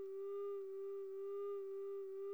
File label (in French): tuyau fermé